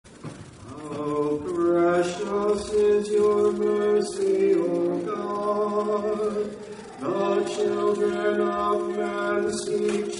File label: Communion Antiphon